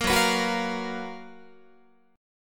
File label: F sharp Major 11th Guitar; F#M11 Chord; strummed